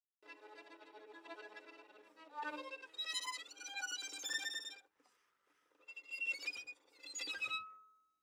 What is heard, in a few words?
tremolo, Baschet; Groupe Geste; Geste Recherche; Extrait Conférence